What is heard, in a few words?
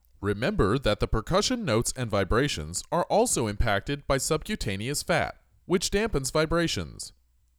Percussion